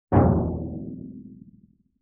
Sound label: Timpani Hit Bright; Vintage Cartoon; Drums & Percussion